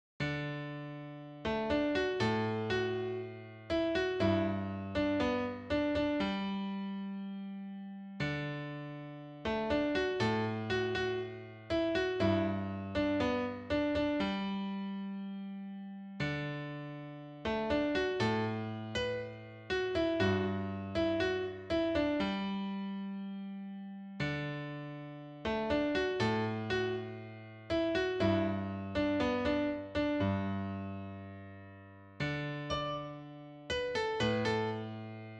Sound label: piano